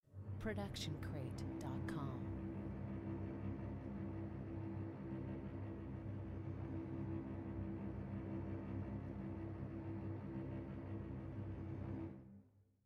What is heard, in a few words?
slow low trem